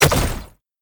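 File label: panga, impact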